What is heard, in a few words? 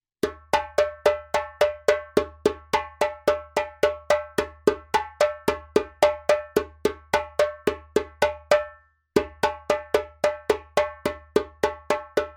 djembe; djembebog oevelse